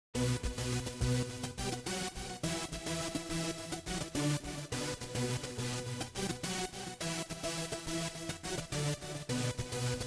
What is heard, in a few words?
Synth Chorus; Remix Sample Pack